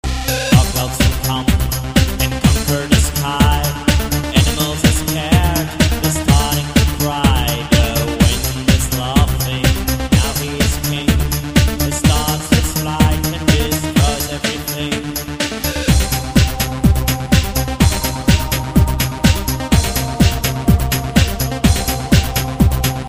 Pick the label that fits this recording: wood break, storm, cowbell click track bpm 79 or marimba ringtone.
storm